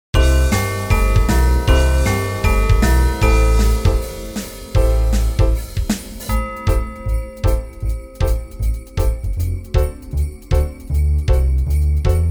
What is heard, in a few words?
Karaoke C